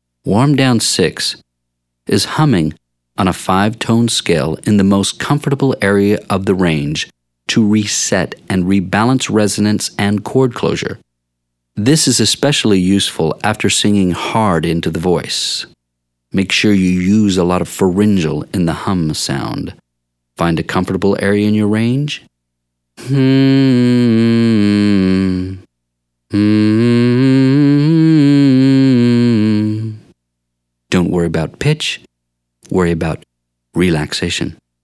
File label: warmdown Humm males; singing lesson